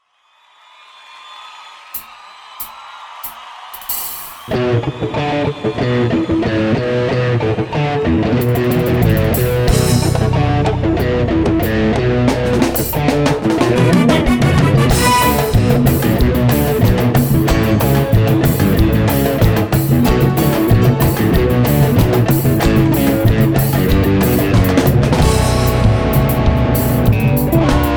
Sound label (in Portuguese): Berimbau metalizado